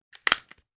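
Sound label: bone fracture, bone crack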